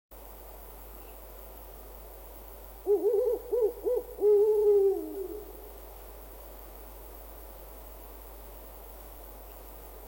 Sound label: distant owls